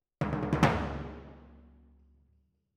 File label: Timp